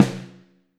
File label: Snare, Alesis DM5